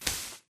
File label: bullet light ballistic sand debris; Titanfall 2